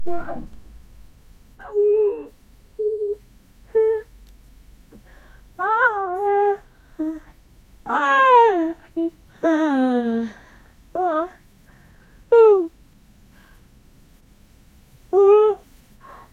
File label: sex laud warm 2 people